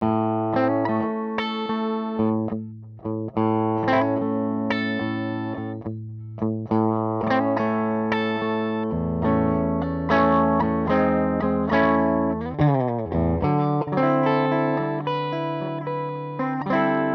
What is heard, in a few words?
Blues